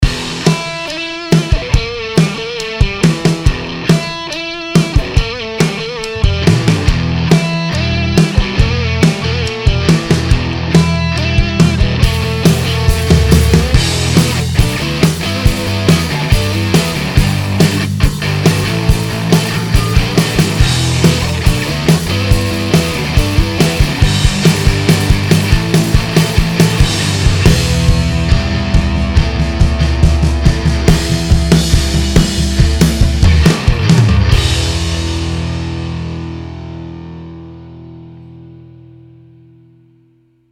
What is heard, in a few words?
Classic Rock Intro